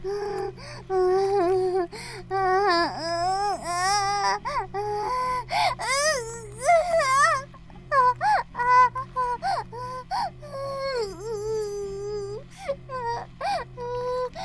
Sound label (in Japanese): バイブ放置; イクたびにわけて